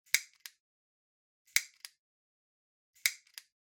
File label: Lighter Click